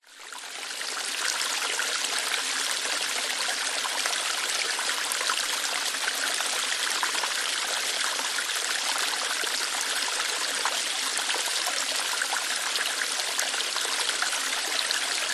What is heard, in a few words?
nature waterfall water lightly flowing through rocks